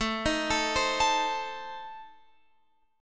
A Diminished, Guitar Open F; arpeggiated; JGuitar

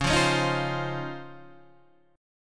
C sharp Major 9th, Guitar, DADGAD

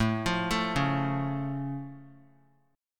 A Augmented A down; arpeggiated; JGuitar

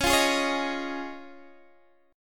C sharp Major Add 9th, Guitar, DADGAD; C#add9; strummed

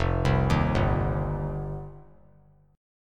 F 7th Suspended 2nd F down; F7sus2; arpeggiated; JGuitar

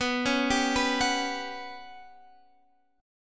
G Major 7th Flat 5th

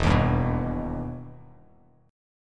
E Minor Major 7th E down Bass Standard; EmM7 strummed

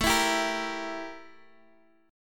E Diminished A

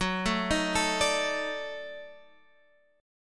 D sharp Minor Major 7th Flat 5th F sharp

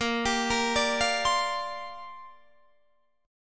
Bb 6th Add 9th Bb Guitar Open D

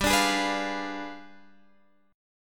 G 7th Flat 9th, Guitar, Standard; strummed; JGuitar

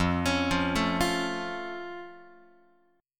F Augmented F Guitar; arpeggiated

